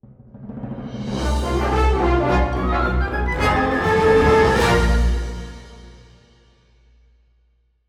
Transition; MultiVersus; Xbox Series X|S

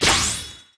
Enemy Shield Mini Guard Break; disney star smash android ios mobile gamerip 2020

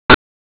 Ghast Shooting; Minecraft: New Nintendo 3DS Edition

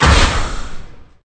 Summon sound

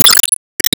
Wallhit Spreadbullet; Se Sp Wallhit Spreadbullet

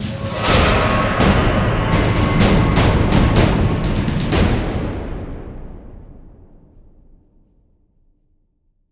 Stinger Fill

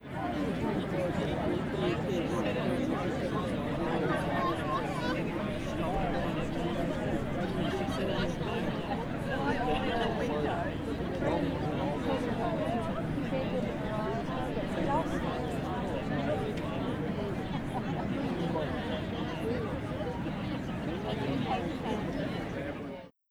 Niagara falls Crowd

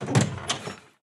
Door Open; Going Under

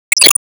Laystormlaser Hit

